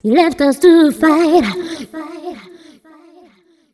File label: f 128 mel e, fem Vocals